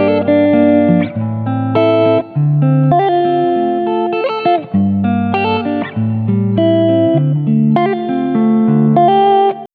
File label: Guitar rnb loop d minor 100bpm